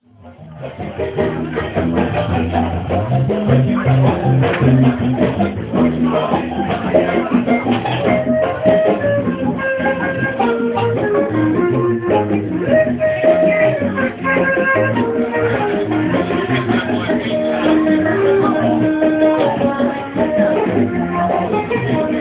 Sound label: party Music